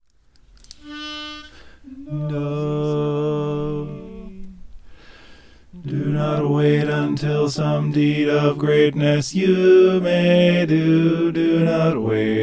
bass; brighten the corner

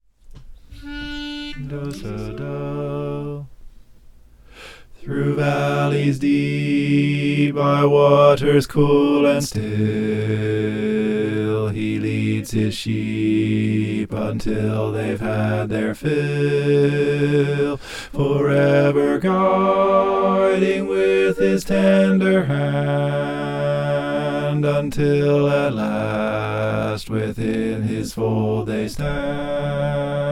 trusting the shepherd bass

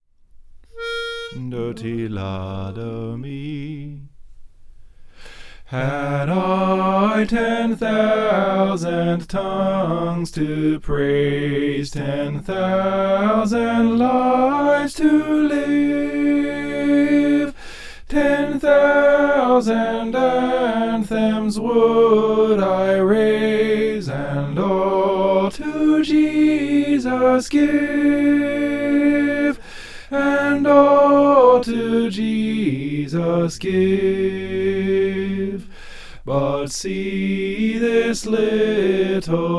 soprano; had i ten thousand

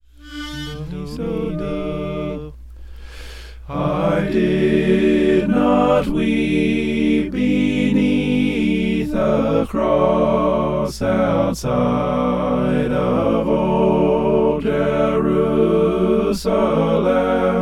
mixed

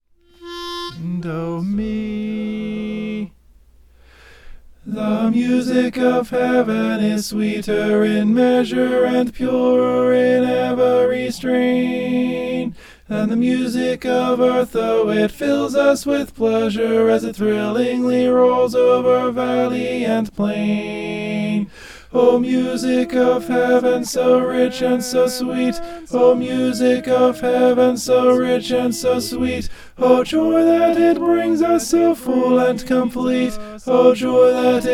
the music of heaven tenor

